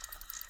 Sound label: fishing reeling